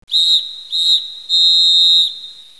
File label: soccer end match; cstrike; jailbreak